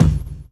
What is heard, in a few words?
BD FONK; E-Lab; X Static Goldmine 2; akai